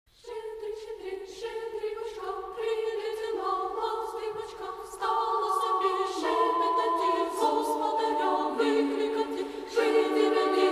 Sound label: Christmas FX Sounds Carol of the Bells